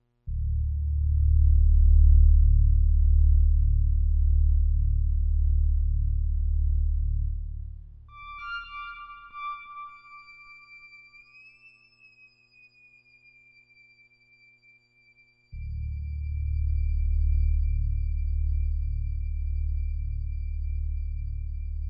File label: Minor Synthphany